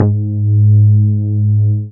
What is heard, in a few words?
BAS MINI, Mini-Moog